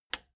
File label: plastic button latch loose release disable